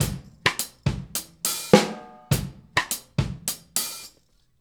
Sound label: Straight Kit, World Pop Beats